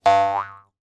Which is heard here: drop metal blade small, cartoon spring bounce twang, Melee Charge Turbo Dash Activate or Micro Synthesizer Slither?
cartoon spring bounce twang